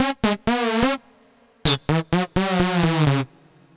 Android Riff, Funk; Dr. Fink's Funk Factory

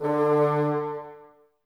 Bassoons D 3; Miroslav Vitous - Woodwind Brass Ensemble

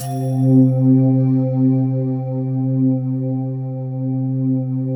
syn C3, MIRAGE Mystic

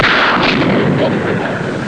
44 MAGNUM, REVOLVER; East West - Global Sounds

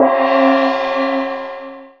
CHINESE PRC, PERCUSSION

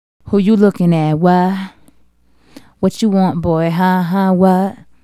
Female Solo, Vocal

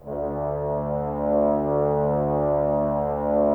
brass, Woodwind Brass Ensemble; Miroslav Vitous - Woodwind Brass Ensemble

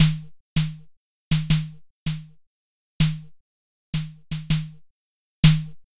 Snare, Snare Patterns; Beat Components

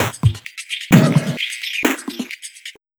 Bumpers; Sonic Foundry (Sony Creative Software); Esoterik Beatz; Messup Fx

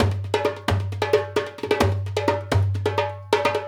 Dumbek; Essential Percussion

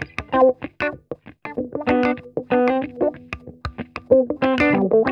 ROLLER, Funk Guitar; Zero G - Funk Guitar

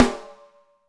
rim, Alesis DM5

